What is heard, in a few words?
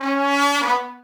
Trombone Missed note; Levels Hub Diner 07 Light Street